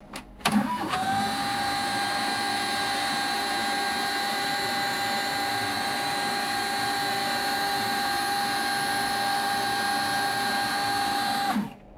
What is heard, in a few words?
high pitched scanner motor whine